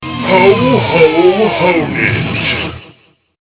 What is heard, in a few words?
ho ho; xmas